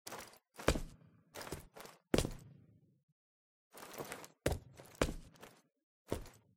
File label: Emote Confused Steps Foley; fortnite emotes